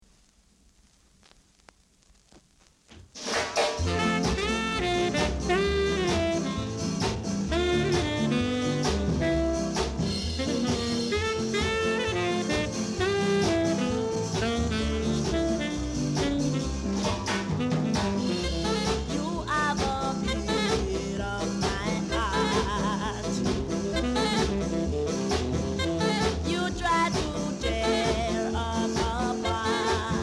beat

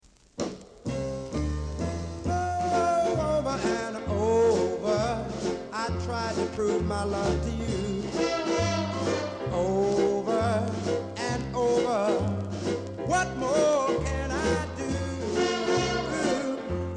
blues

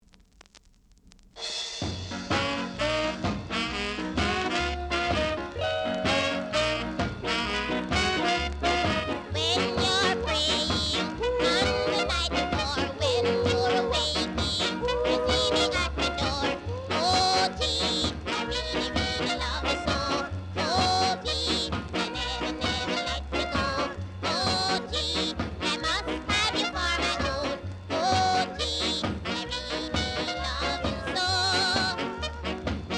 jazz

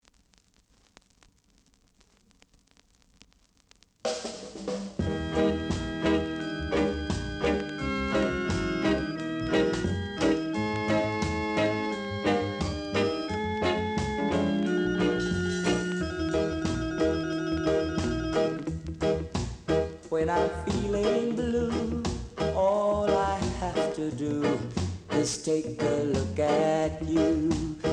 groove

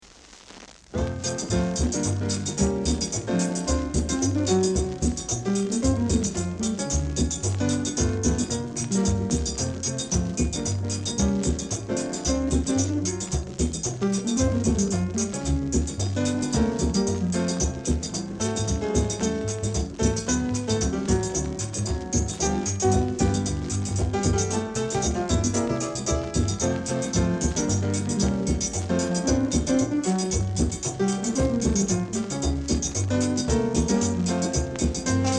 melody

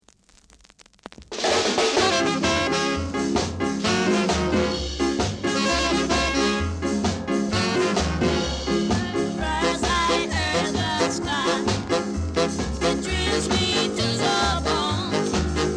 beat

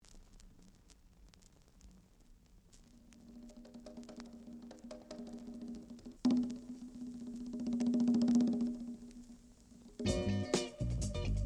funk

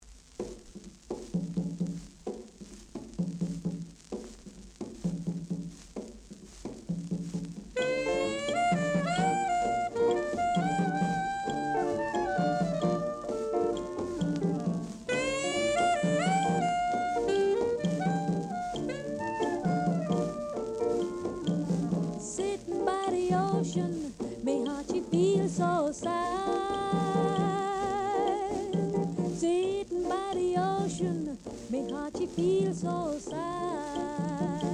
blues

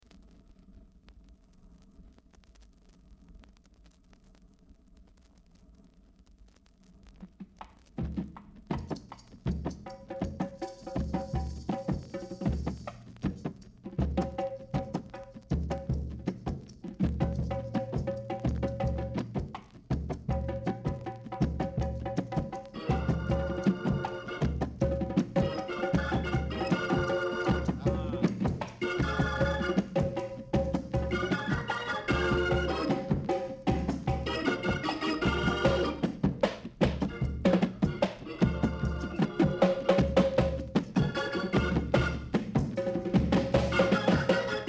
drum

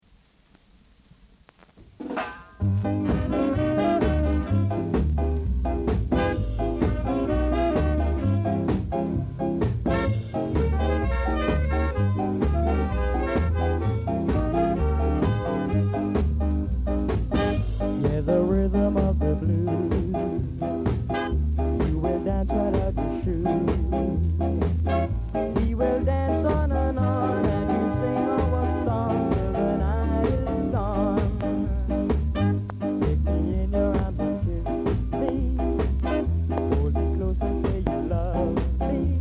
blues

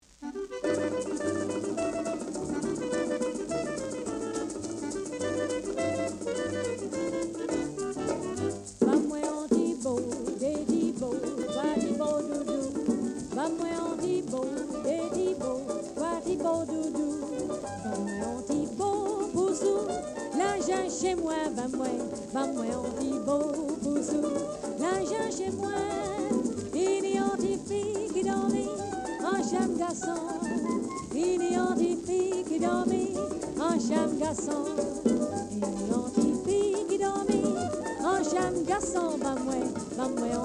blues

